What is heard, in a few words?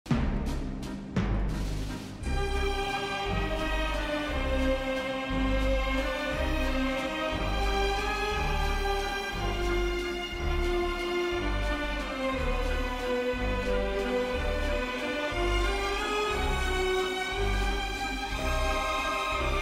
orchestra ringtone